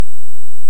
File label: Hydrophone; OneOcean